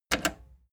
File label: plastic button slack click fast